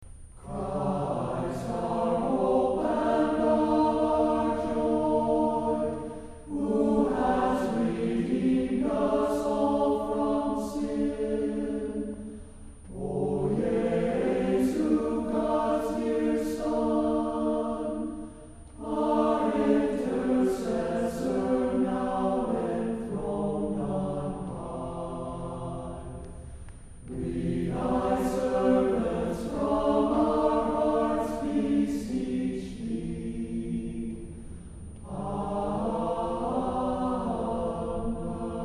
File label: Choir, organ